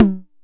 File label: Bass Tom